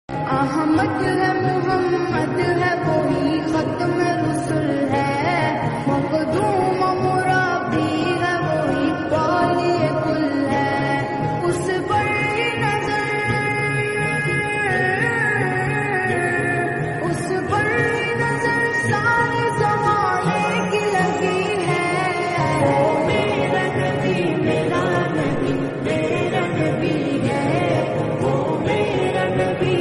devotional islamic naat

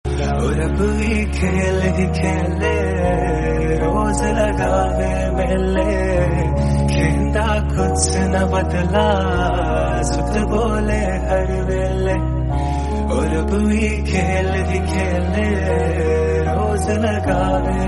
bgm hindi love

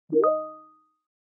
teams message microsoft teams calling sound remix

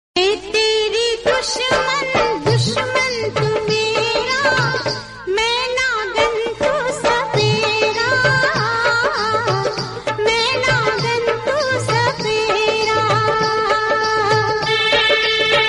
hindi sister love song

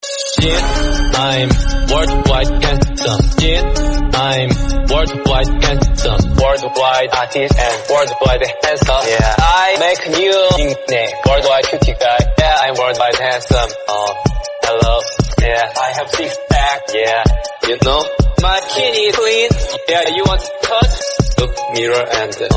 marimba remix instrumental bgm

